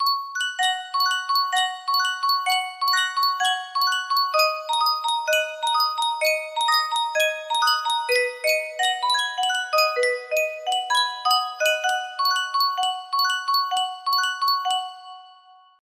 Yunsheng Custom Tune Music Box, music box melody

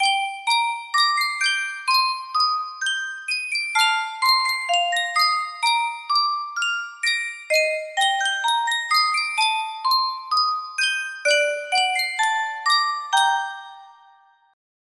yunsheng custom tune music box

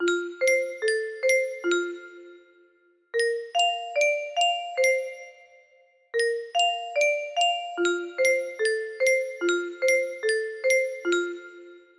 melody

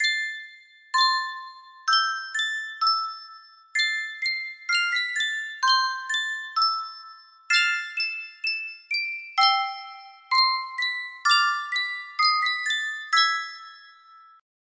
Yunsheng music box, music box melody; final fantasy ix

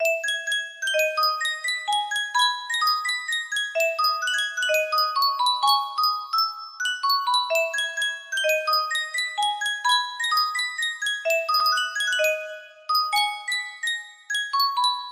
Sankyo music box, music box melody

